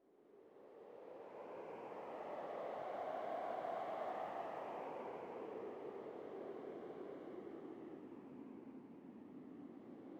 sting wind gust; Rust

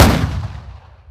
ac130 40mm fire

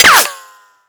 shield small

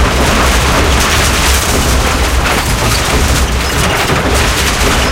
plane overload loop

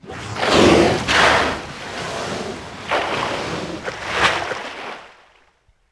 Whale Blow; Civ4 warlords; Resouces and Improvements

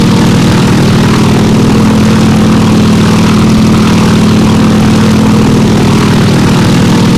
yak rear, engines; War Thunder

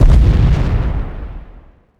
mohole slam

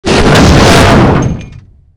crash, damage; DCS

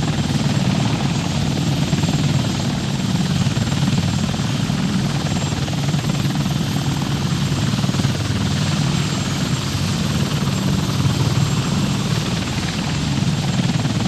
apache engine onload outside, tanks; War Thunder

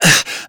breathing hurt; Call of Duty Modern Warfare

